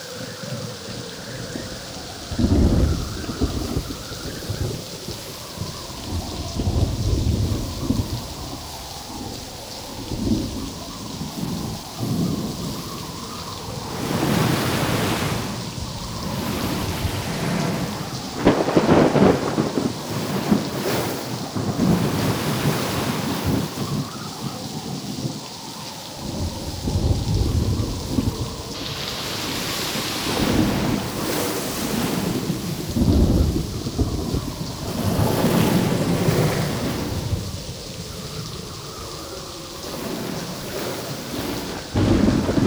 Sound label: Ambience (w rain); Discovery on Kamino; Lego Star Wars (PC)